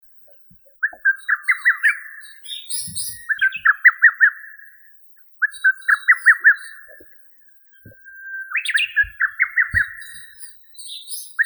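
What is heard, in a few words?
lesser cuckoo, Cuculus poliocephalus; kukushka malaya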